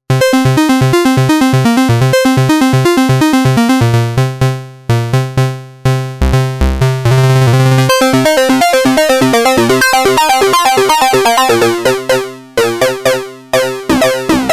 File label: Hardsync